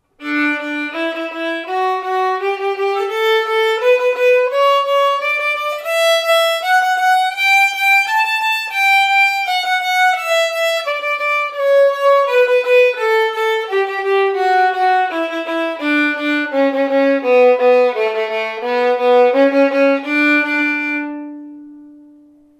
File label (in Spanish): re ritmos, escalas; mi primer libro de escalas